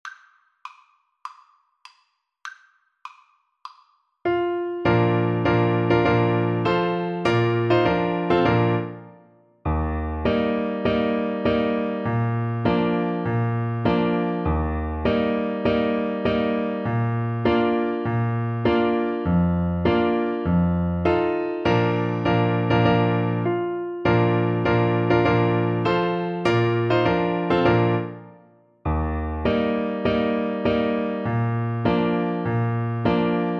march, Clarinet; hohenfriedberger march

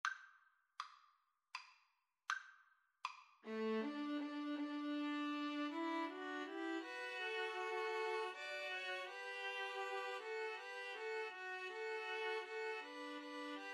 Shenandoah String trio; Slow Playalong

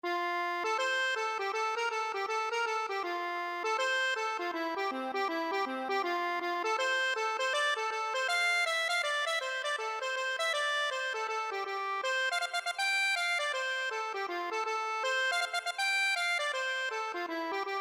accordion

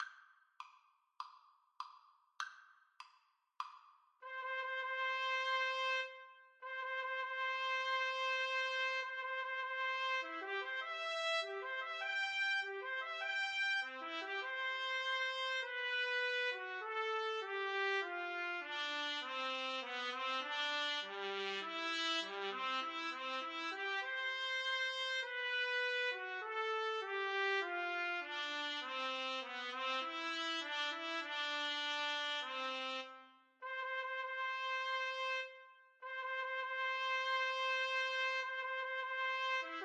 wedding march duet TPT DUET, Trumpet Duet

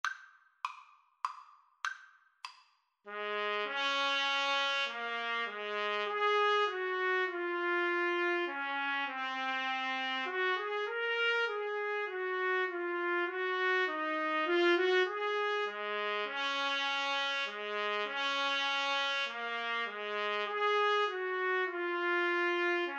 first noel trumpet duet

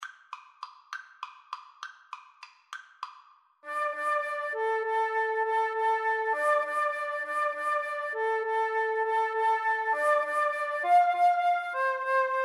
pirates, Flute Duet

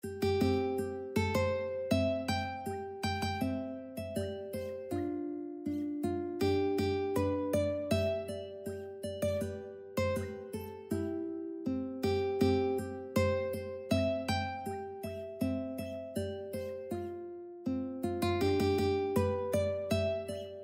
ukulele

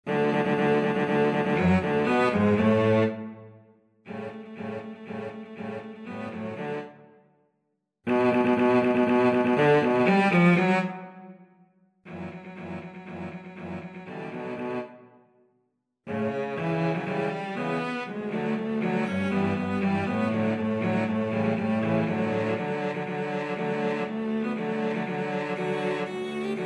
cello quartet

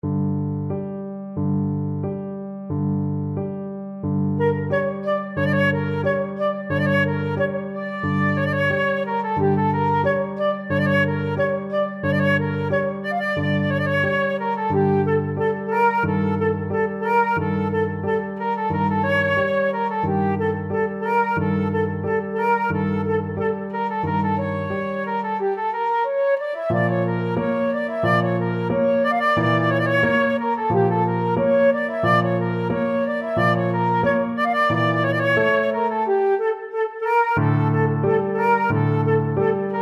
romanian folkdance, flute

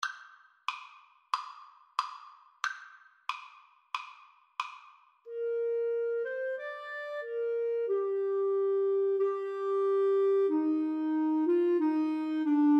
bleak midwinter, Flute-Clarinet Duet

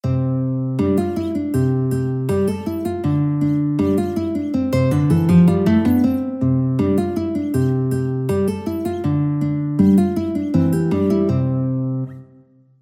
cancan, guitar

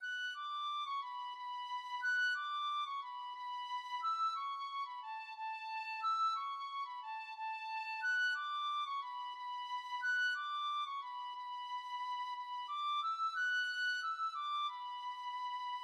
brian boru march, tin whistle

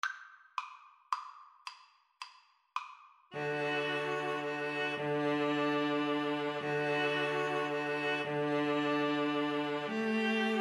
silent night, String trio

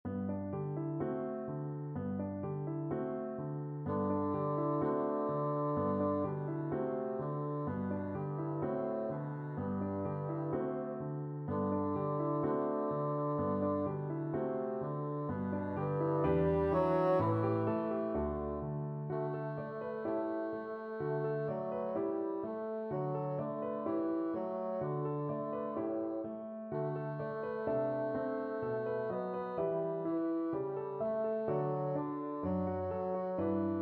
faure berceuse, bassoon

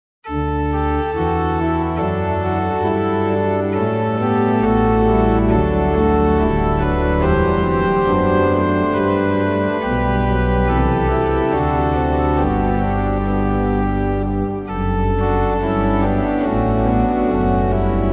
organ

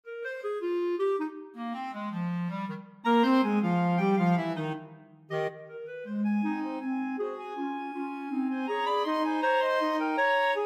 clarinet trio

